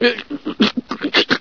scientist die; hl1; Source engine

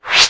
space maximize, windows 9x sound schemes

